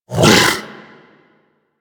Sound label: Kubrow Vocal Tranq Dart Grunt; Warframe; Hunting Critters; Orokin Kubrow